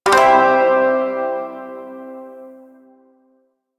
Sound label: Shawzin Pent Maj Chord G, Music; Chords